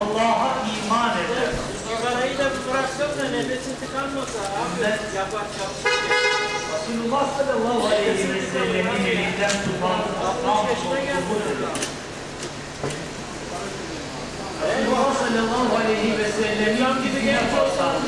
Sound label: galata friday prayers